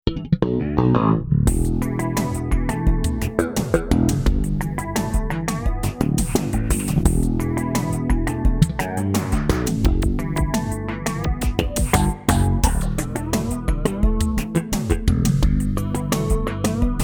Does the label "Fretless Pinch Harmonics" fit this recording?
Yes